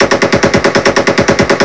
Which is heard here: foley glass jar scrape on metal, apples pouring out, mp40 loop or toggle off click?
mp40 loop